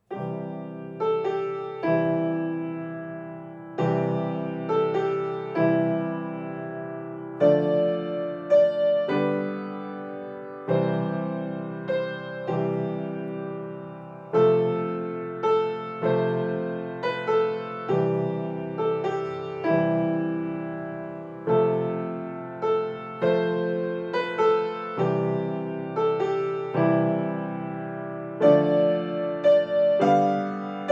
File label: douce nuit sainte nuit gentle night holy night beginner